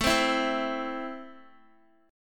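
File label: A Minor, Guitar, chord strum; strummed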